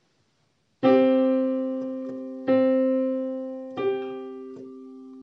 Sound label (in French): quinte